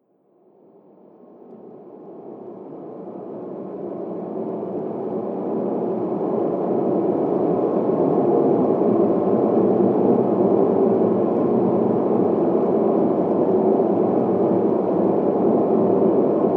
Drone Dark And Industrial Horror, scary soundscape sound effects